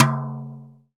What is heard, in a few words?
TOM SLAP, Real Toms, Dry Toms; Roland L-CD701